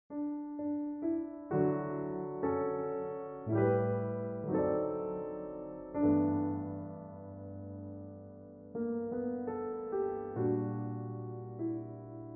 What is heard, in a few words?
Piano sketch jazz bar style